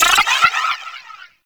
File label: Power Blocked Locate A; Warframe; Corpus Modular Crewman